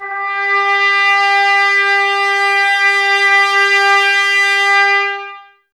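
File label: Brass trumpets G, Mute; Miroslav Vitous - Woodwind Brass Ensemble; Tps Mute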